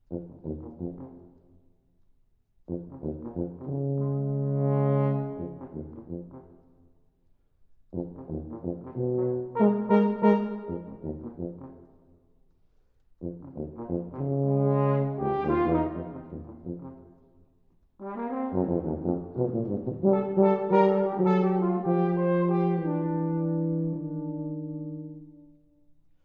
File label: Dialogues For Horn And Tuba, Dance and Epilogue